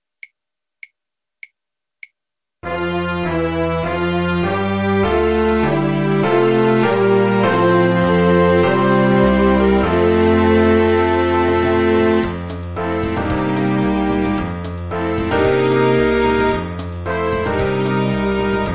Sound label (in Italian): Adeste fideles, solista e base; Adeste fideles (O Come All Ye Faithful); canti natalizi; Simbarrangements